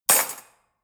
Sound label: cutlery fork drawer place hard